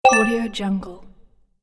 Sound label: retro dungeon crawler item collect